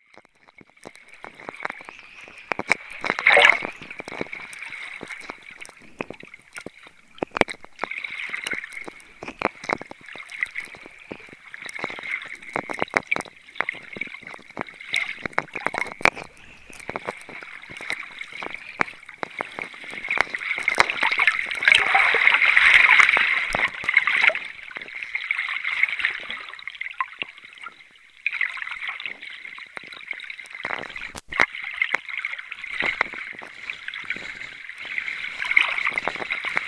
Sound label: water under